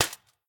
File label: clap; Smite; god Lancelot